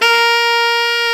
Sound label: SAX A. FF A, Alto Short; Roland LCDP07 Super Sax